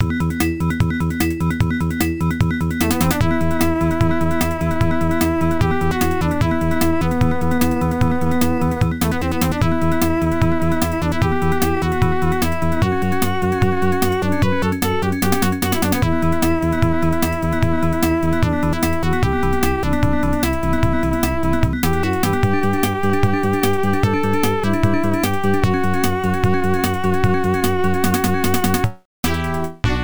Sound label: Dungeon; Undeadline; MSX2; OPLL